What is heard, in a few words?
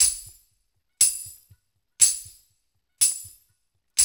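120 bpm, Spoons; Spectrasonics - Supreme Beats - World Dance; Daoud Spoons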